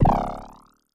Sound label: synth boing